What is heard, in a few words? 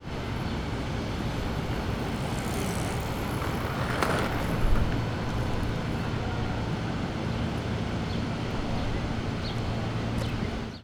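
street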